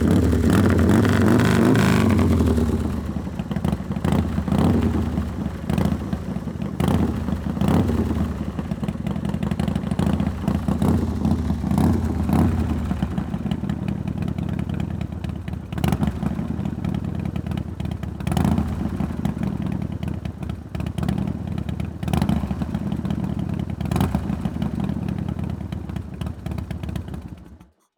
motorcycle, FatBoy, 100year, straight pipes, tuned engine, parked, far, rev down, throttling; sporadic; Eiravaein Sound; Anarchy